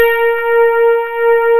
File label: HARD RHODS A#3; East West; Global Sounds; D50